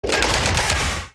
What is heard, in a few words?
emp arm extend, factorio sound entity electromagnetic plant